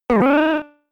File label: Lick